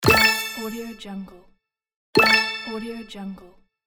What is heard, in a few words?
game buy